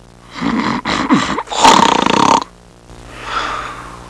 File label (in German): schnarchen